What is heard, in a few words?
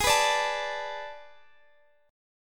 A Major 7th Suspended 2nd Suspended 4th